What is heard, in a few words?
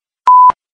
beep sound